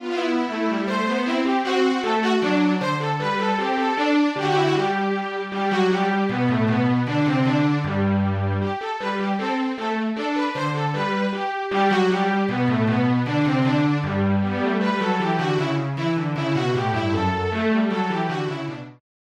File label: korg x5-d strings